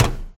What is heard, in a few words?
footstep metal low walk; ProSoundCollection v1 3 MONO